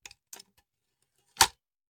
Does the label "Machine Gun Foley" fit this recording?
Yes